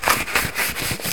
Close Blueprint; Blueprint 1.0

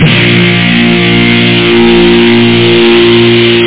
GUITAR; Utilities Professional

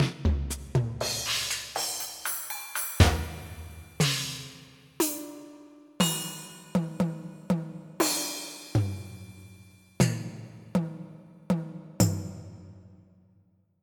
Metl Drums Soak

